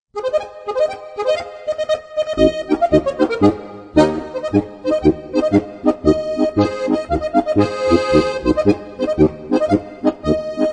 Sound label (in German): polka